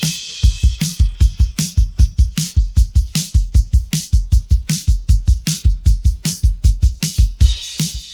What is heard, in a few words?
pattern, drums